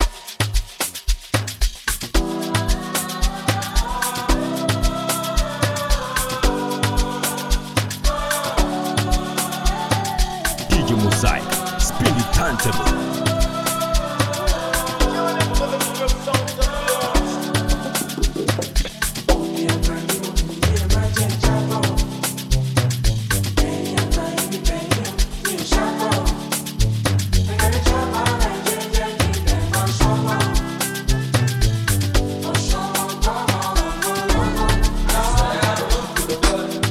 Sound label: Amapiano